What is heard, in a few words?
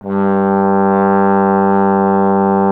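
Brass Trombone G; Miroslav Vitous - Solo Instruments VOL-1; 90 s Sample CDs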